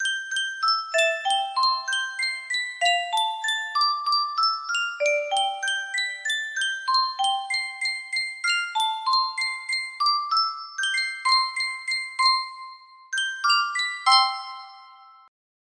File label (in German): Yunsheng Spieluhr